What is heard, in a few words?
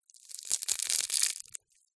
bird chick emerge from egg shell cracks; animal